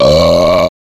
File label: Burp